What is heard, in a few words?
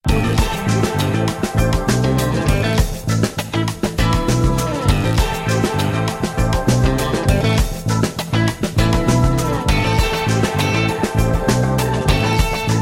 funky catwalk models logo